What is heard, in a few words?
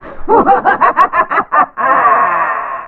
filter evil laugh